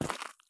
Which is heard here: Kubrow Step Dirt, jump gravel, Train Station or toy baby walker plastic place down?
jump gravel